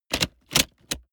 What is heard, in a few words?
plastic latch full